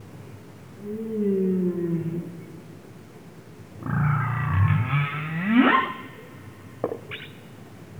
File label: humpback non song